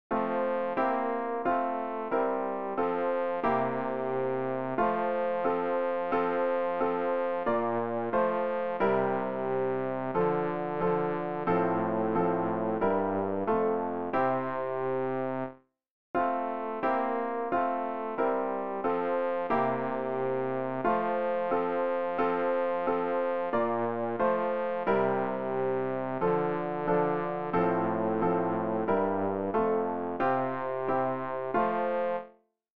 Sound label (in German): bass; Ref. Gesangbuch; RG 599: Der Mond ist aufgegangen